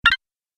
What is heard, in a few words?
Interface Sound, electrical button